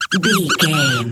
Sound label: voice fun creature squirrel